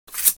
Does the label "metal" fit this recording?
Yes